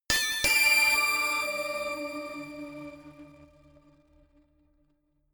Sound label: notification glockenspiel crazy fourth up